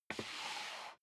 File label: step sneaker wood creak slide long; schritte